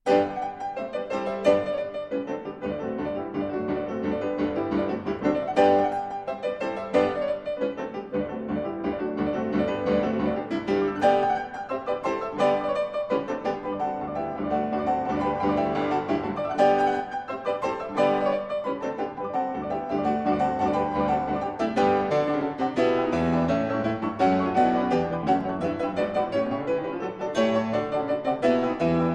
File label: Piano 4-Hands; Tchaikovsky Nutcracker Suite, Op. 71a, TH. 35 (Arr. by Economou for Piano 4-Hands) - IIc. Danse